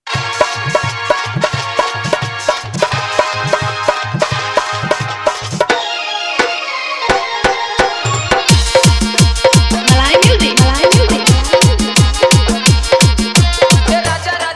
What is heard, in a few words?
Bhojpuri Remix